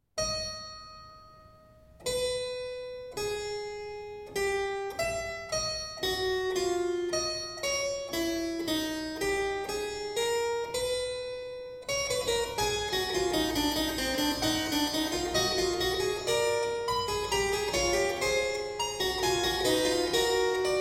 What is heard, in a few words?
a minor fugue, Fugue Harpsichord